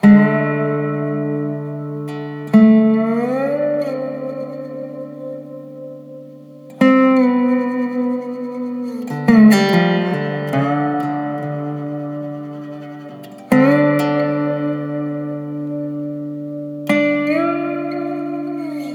Master Room MR-IV Dobro

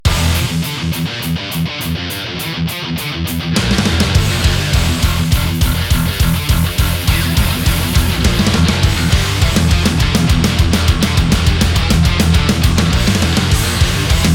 higain; kemper

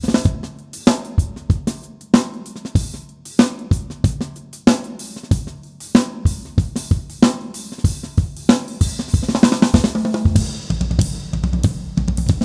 Drums akg bx15; Nevo

